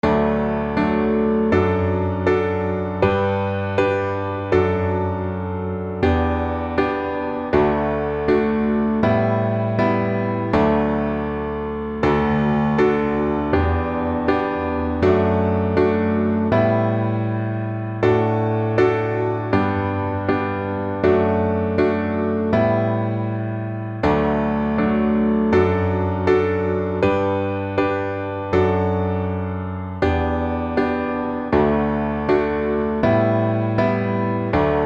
kirakiraboshi piano

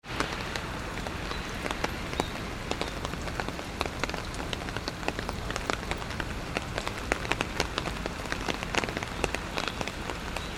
rain

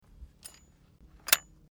scabbard

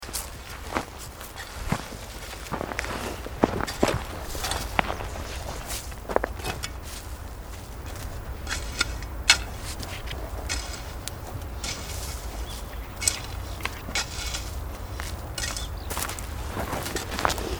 plow a field

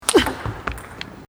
pingpong smash

